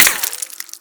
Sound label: impact snow; weapons; Modern Warfare